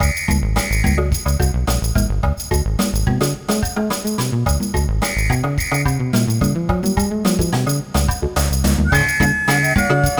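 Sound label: background music; Machi Unmei no Kosaten Tokubetsu Hen; PSP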